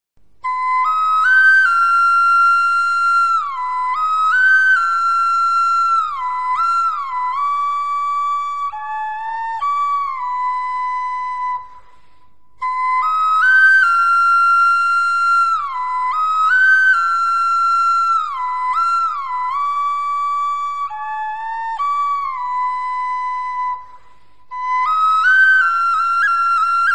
flute theme

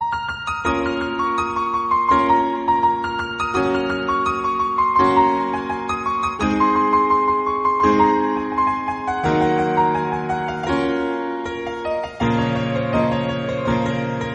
instrumental

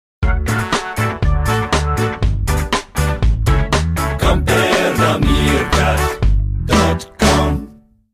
meerkat jingle

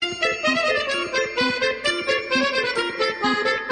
circus polka

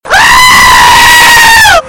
goats screaming loud

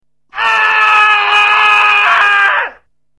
man scream

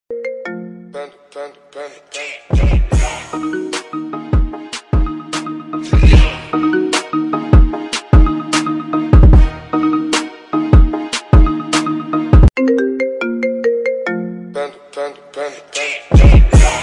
marimba remix

